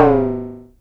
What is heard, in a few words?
SYNCUSSION